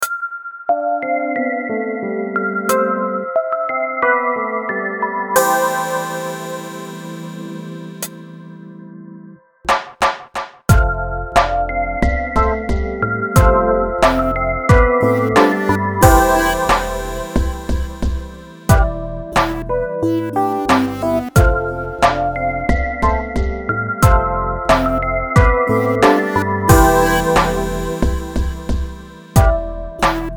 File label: instrumental; gazdag erzsi